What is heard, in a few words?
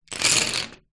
office pencils pile x30 movement on table